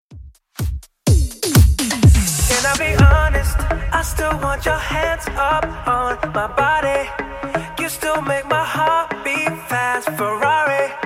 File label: House Redrum 125bpm